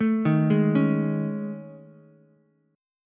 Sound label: A Major 7th Flat 5th, Ukulele; AM7b5 arpeggiated; JGuitar